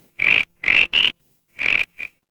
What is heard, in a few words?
animal frog